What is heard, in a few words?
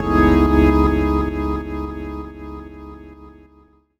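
Pad Synth; Equipped Music; Loungin House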